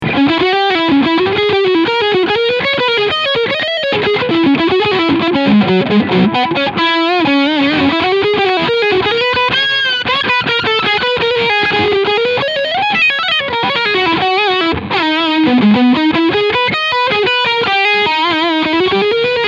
Gibson Les Paul Standard 50s Leadsound Bridge Pickup